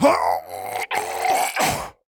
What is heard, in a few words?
Bear Death